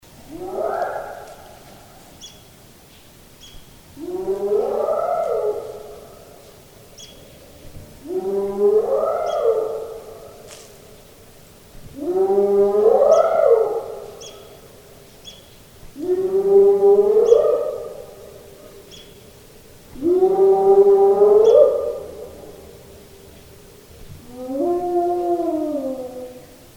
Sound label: spotted hyaena, animal songs; manapools